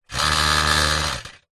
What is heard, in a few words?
power tool vibrate grind metal motor hum short on off burst; industrial